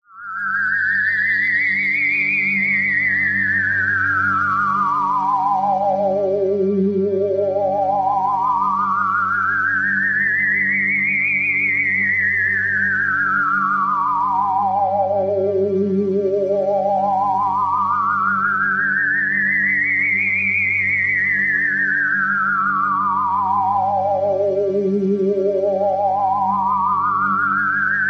science fiction classic alien synth line cartoon like